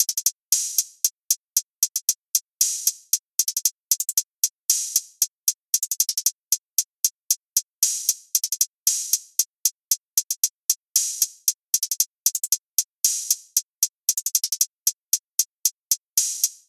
Hihat Loop; Cymatics; Chupacabra

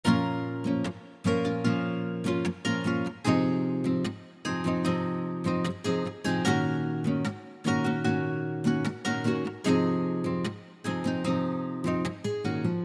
Sixteen Bars 2 Octave Nylon Fingered, real guitar

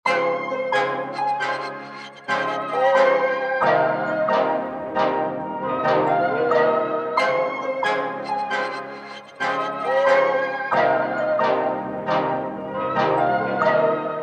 Vintage RnB Melody; Cymatics; Mantra

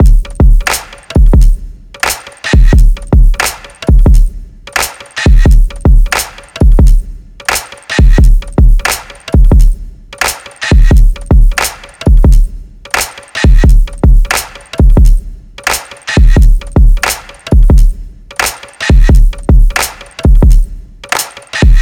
Acid Heavy Drum Loop; Cymatics

